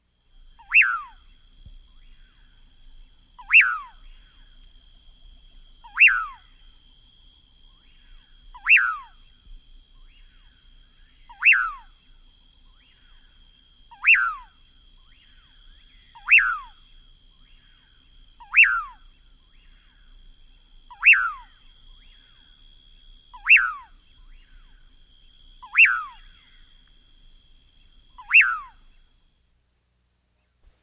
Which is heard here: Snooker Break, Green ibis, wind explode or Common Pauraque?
Common Pauraque